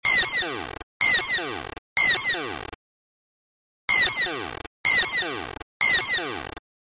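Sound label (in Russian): alarm sound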